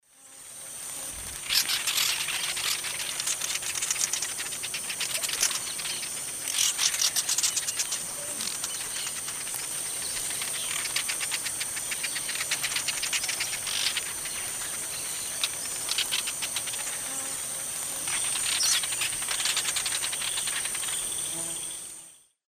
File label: Progne elegans, calls from flock; S. America; Eastern Lowlands